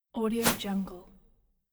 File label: whoosh swoosh woosh; jorgepires